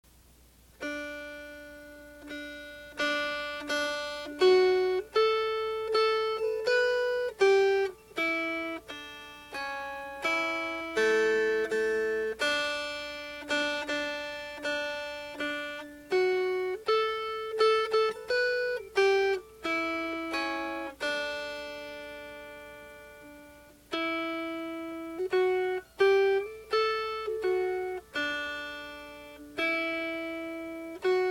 Melody; Early One Morning

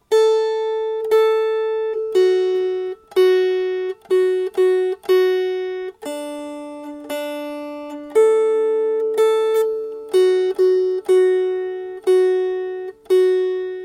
Melody

